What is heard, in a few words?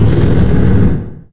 burner; PC Gamer IT CD 41; machines demo